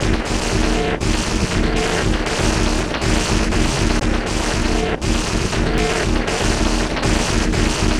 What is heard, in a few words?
Loop; Sonic Foundry (Sony Creative Software); Esoterik Beatz; Mole